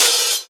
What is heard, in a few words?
Open Hihats, Cymbals